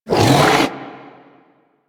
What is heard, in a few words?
Rock Punch Cast; Warframe; Brawler